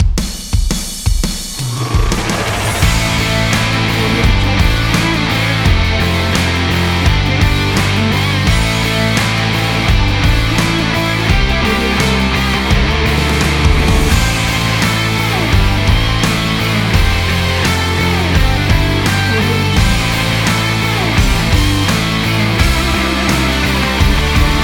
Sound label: epic motivational intro rock trailer